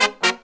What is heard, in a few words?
Horns 132BPM; Zero-G; Phantom Horns